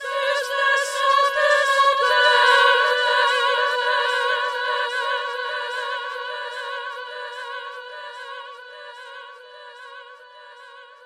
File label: infinite echoes spread wet